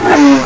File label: jump; quake2; moobull